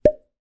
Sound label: cartoon pop small lid; zapsplat